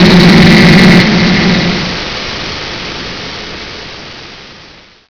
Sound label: static; svencoop; keenhalloween